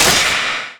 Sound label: powerup pickup reduced damage; Garry's Mod; tf2